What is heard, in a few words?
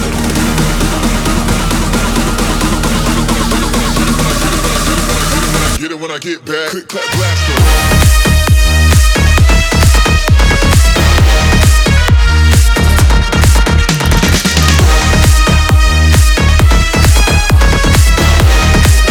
Flamenco